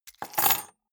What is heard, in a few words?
key ring large place table